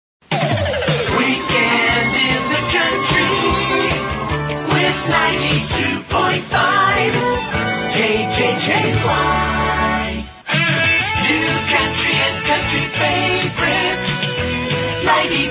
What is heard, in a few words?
jingles; kjjy; jam prod